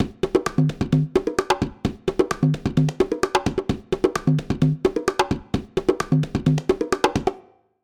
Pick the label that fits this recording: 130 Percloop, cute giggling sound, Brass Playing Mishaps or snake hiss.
130 Percloop